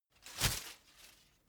whoosh paper scrap flutter; swooshes-swishes

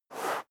step sneaker sand slide short; schritte

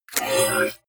sci-fi click neutral medium; maschinen; signale; soundpool

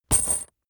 metal chain impact

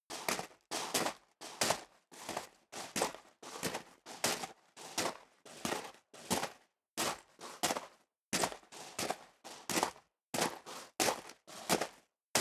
step leather shoes gravel medium walk sequence; schritte

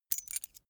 key ring mid size put down; objekte; schluessel

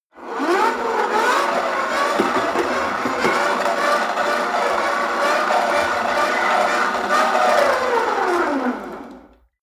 rope trainer pull sequence fast long

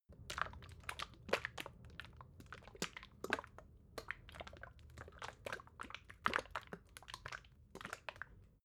bottle syrup movement unsteady, gastronomie; berufe

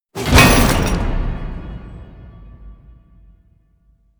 metal crash; hits explosionen; metall

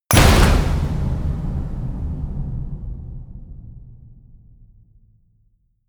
hit metal; hits explosionen; metall

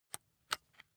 rock drill head remove soft; maschinen; bohrer; soundpool

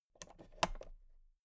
toolbox latch open soft

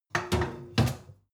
sink water pot sink place; industrie-wirtschaft; gastronomie

